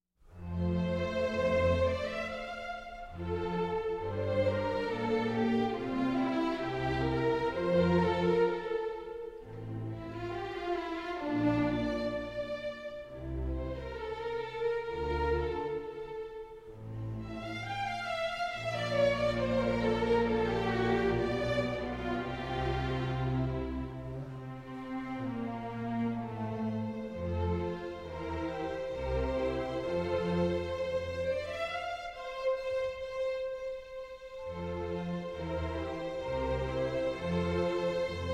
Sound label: Cello Concerto No. 1 in C Major, Adagio